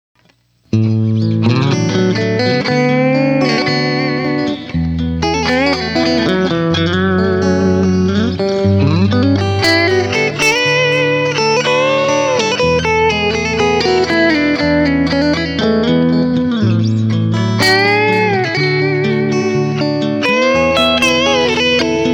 clean, Seymour Duncan SA-6 MagMic

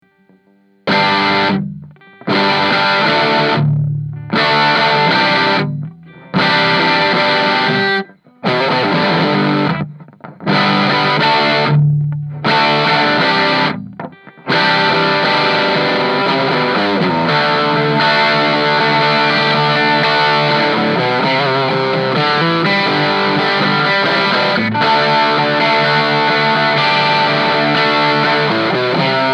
58 mid dirty, gibson les paul; Gibson Les Paul 1958 Gloss Standard Reissue Tea Burst

